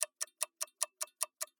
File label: loading